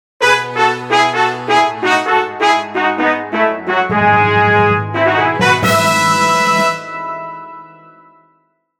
ファンファーレ

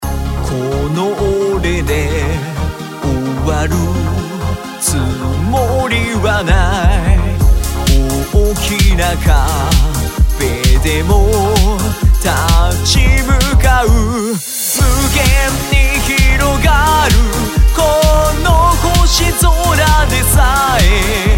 歌

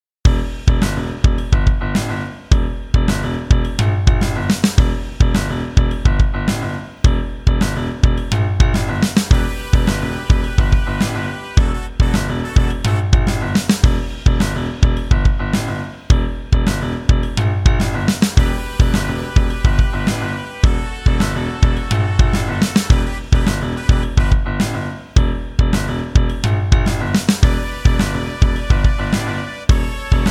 緊迫曲 long; スリル、真剣、重いシーン向け; 緊迫曲1-2 ロングバージョン 38秒

